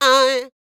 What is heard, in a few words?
D BIT, Vocal R&B Dance